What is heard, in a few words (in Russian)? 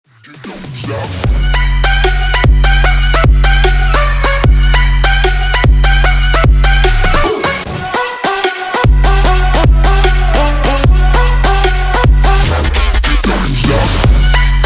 trap ringtony na zvonok